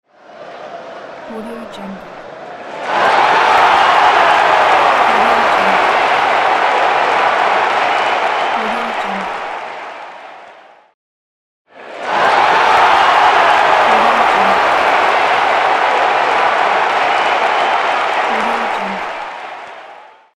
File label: football goal reaction